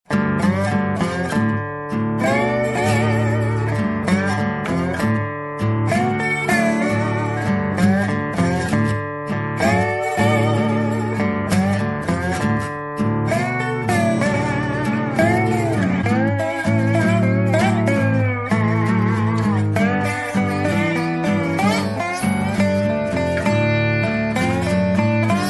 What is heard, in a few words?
Delta Blues